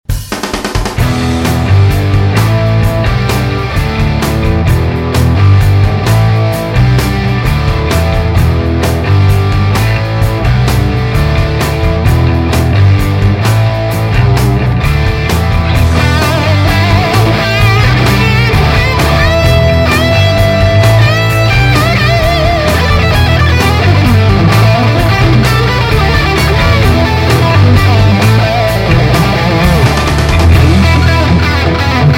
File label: Mercury EL34 CH2 SG; SG Angus